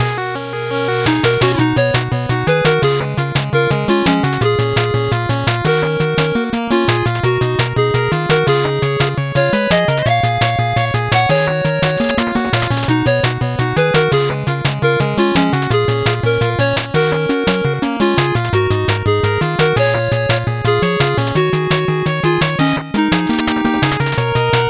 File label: 8bit bgm fast